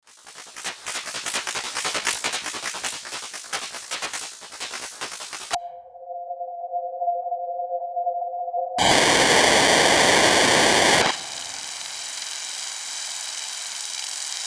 noisetar presets

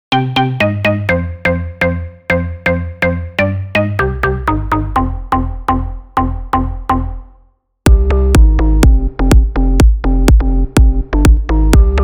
bass; phase plant bass expansion

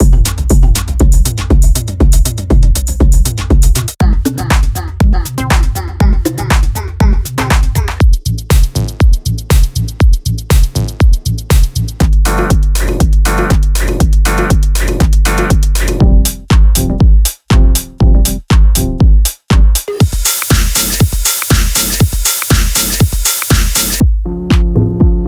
techno house; klip

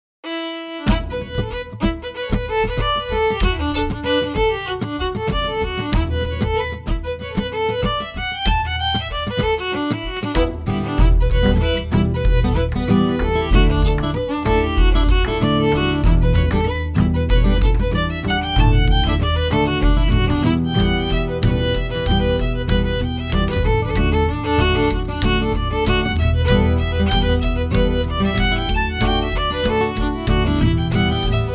reel fiddle; cooleys

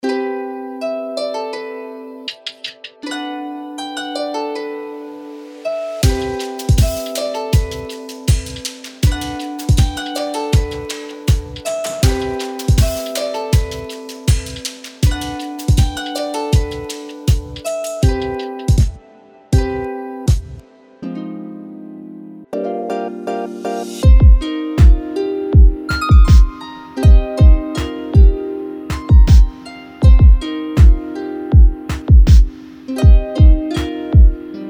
chill beat harps

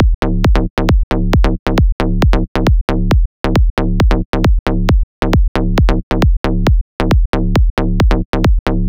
melodic enchantment

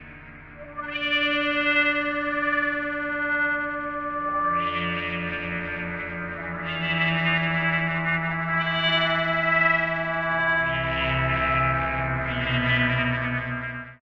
atmospheric ghosts

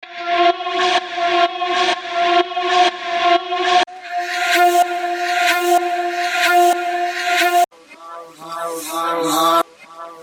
vox fx

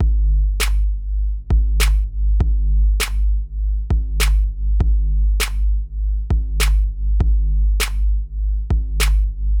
hiphop drum

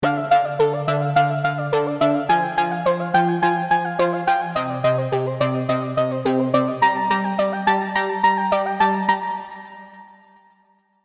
serum seqs arp; chiqui audio

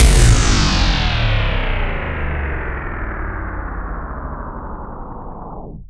droid

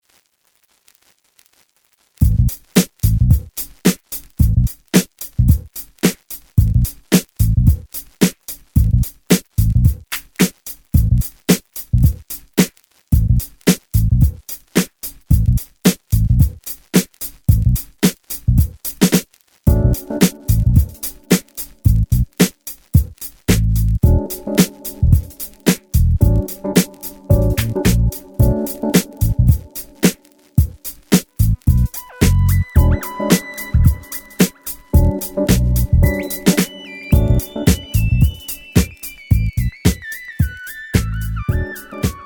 funk

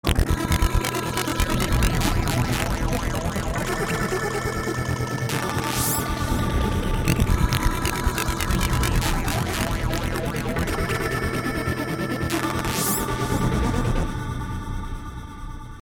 game music vs ambient

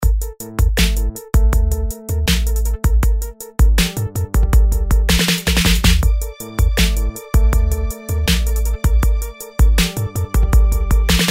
urban remix

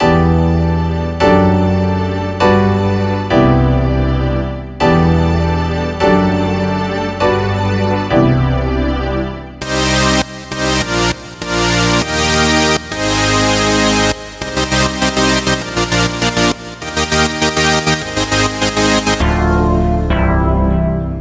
phase plant synths

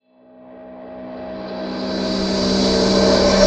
cinematic textures and drones

